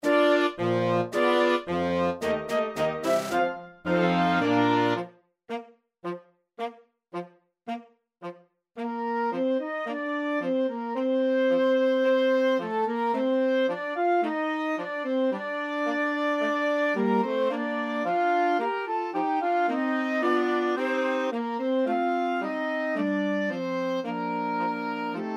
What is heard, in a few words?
Concert March, Wind Band